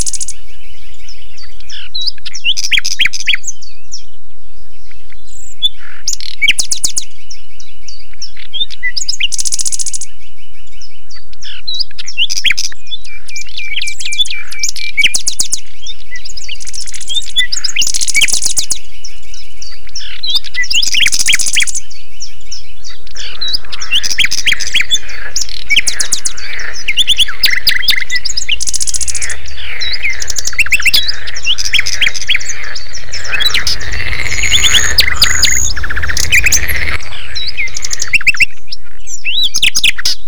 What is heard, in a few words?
Sonification of Network Traffic Flow